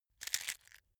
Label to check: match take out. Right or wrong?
Right